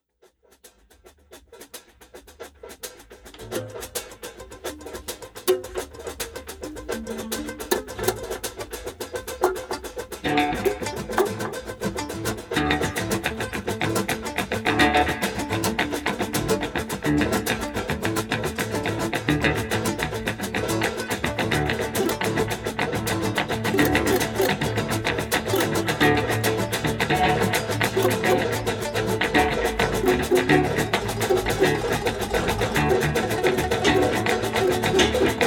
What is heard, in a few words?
mutes; bird